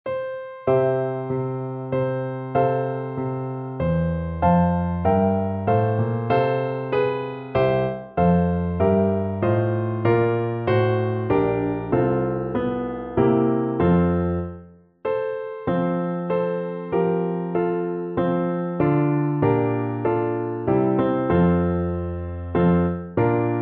Ich bete an die Macht der Liebe (Oboe Solo + Klavier-Playback)

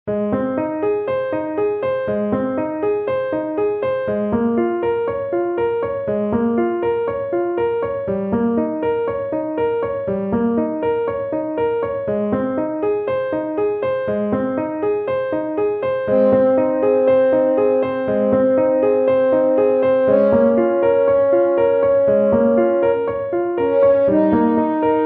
Horn Solo + Orgel; Ave Maria

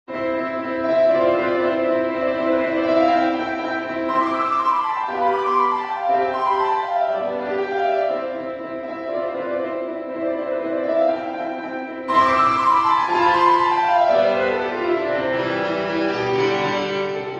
Sonatine

